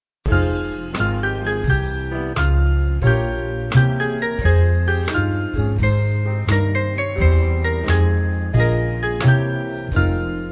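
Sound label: Band, Music Backing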